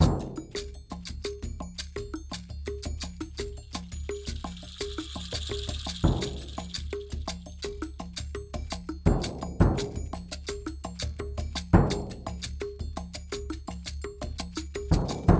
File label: loop, hammond riff